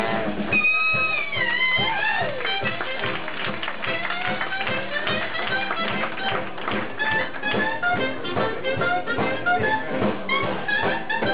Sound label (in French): Clarinette Extrait Jazz